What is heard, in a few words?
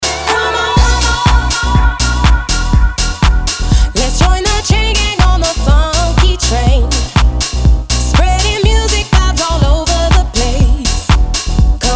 funky train